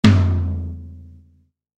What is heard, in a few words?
tom, drum kit